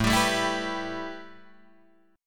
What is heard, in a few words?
A 7th Suspended 2nd Guitar Drop D